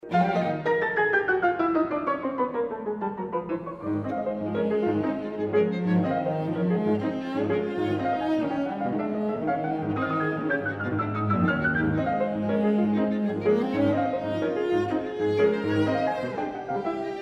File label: piano trio; godard